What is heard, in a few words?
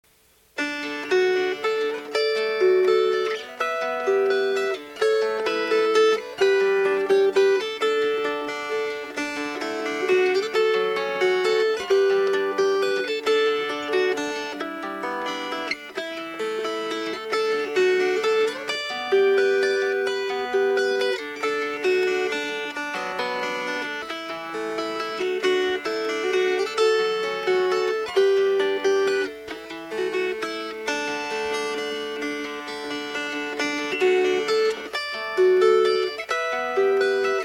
Fingerpicked